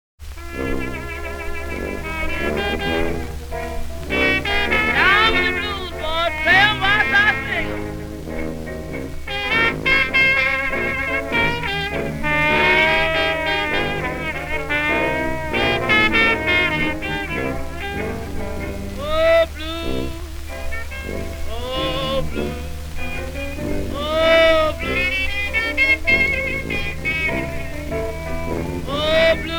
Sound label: blues song samples